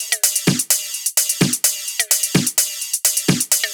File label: Drums Top 128